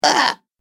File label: Goblin Hurt, monster; horror